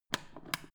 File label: foot switch turn on off slow